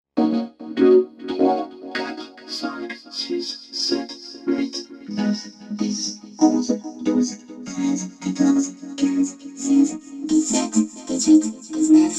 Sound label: microkorg vocoder; Push-Pull